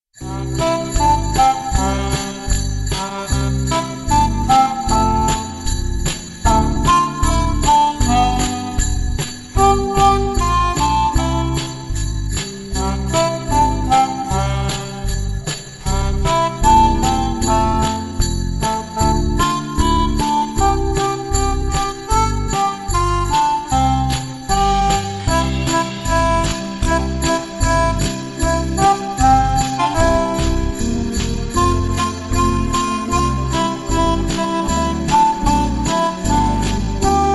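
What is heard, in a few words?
Jingle Bells, Harmonica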